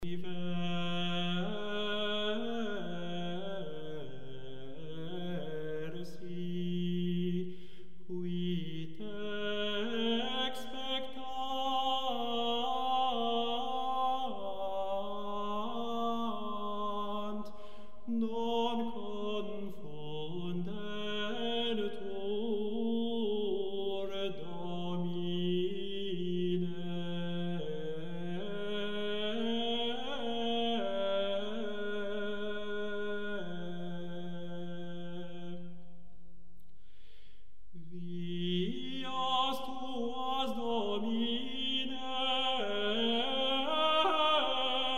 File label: dorian chant